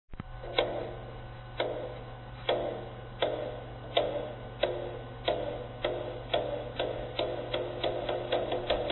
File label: taiko